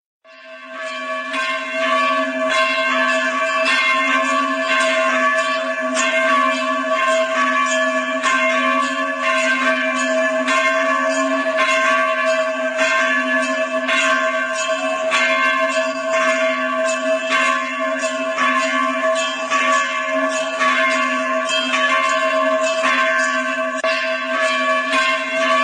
temple bell during aarti

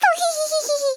pohehehehe

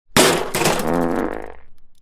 sub alert

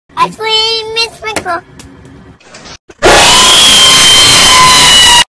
earrape

